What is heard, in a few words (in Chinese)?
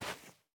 细雪破坏声